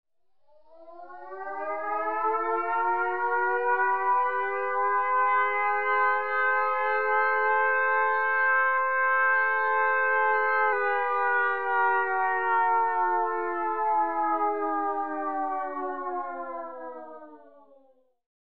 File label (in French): sirene